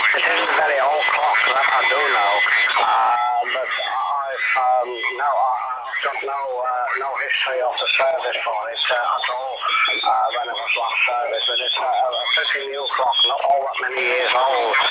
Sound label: 40M band